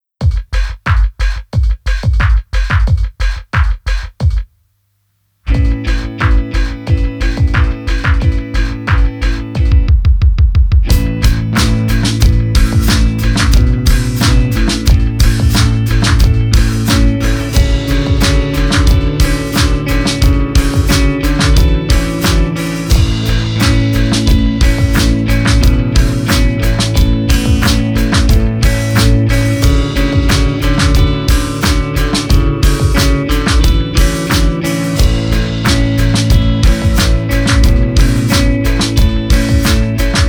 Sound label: Breaks; Limited Edition (legacy series); Witham